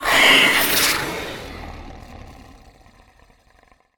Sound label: Raptor hurt